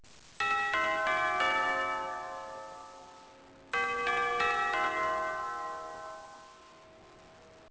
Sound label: Bells